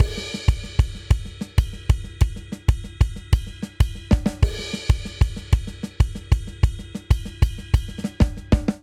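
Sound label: Simple Seven Outro 7/8 190 bpm, drums; Ableton; Factory Packs; Drum Booth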